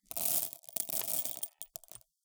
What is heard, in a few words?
Peas Into Glass Cup; Alexander Ahura; Groats Part1